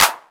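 raw acoustic snare sample a key, tuned to the A note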